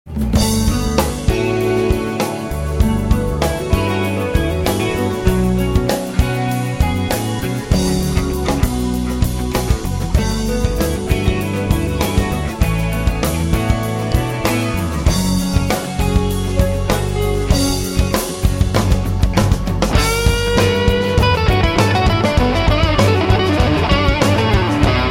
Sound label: Left Solo